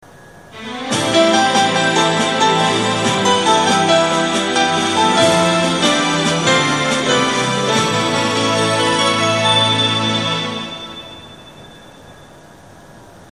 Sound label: Kyushu chime